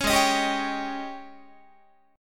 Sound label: G sharp Major 7th Suspended 4th, Guitar